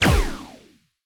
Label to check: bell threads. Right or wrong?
Wrong